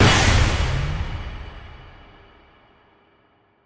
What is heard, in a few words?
scatter Landing; netent; doublestacks